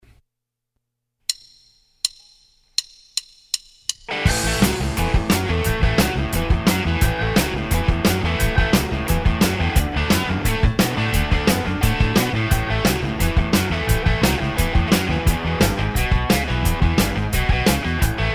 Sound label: with drums